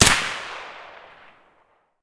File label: ak74 raw far; Escape from Tarkov